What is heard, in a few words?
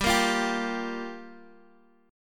G Minor 7th, Guitar, Half Step Down